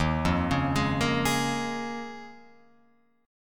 Eb 7th Suspended 2nd Suspended 4th Eb down Guitar Open F, Eb7sus2sus4 chord; arpeggiated; JGuitar